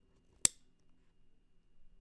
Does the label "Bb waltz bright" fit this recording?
No